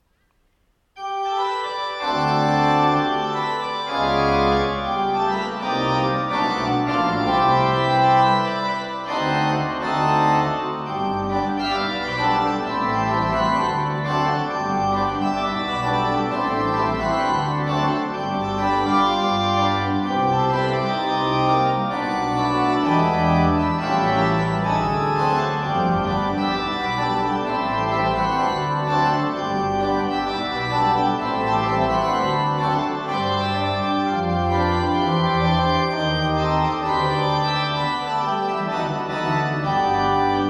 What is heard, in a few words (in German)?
grosse orgel